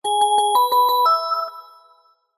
multimedia alert notification musical soft chimes fanfare